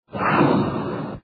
ROCKET JET PACK PASS

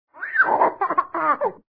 BABY LAUGHING

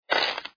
coin tabl

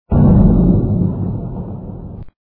DOOR HATCH LARGE; HYDRA

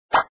drip

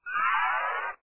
ELECTRONIC ELECTRONIC

